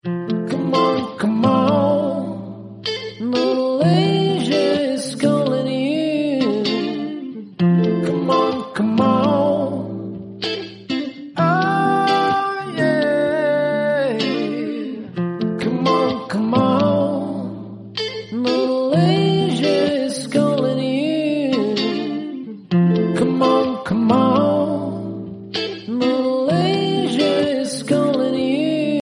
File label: acoustic ringtone; malaysia